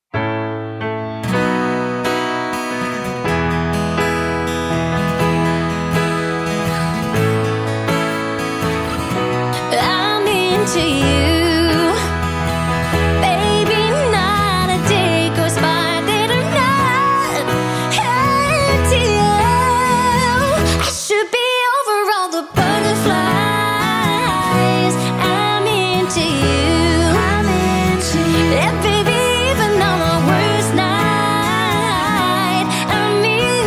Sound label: Acoustic